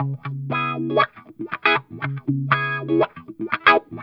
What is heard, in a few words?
Guitar Db Funk